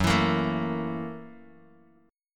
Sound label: Gb 7th Sharp 9th Gb Bass Standard; strummed; Gb7#9; 14,19,20,14; JGuitar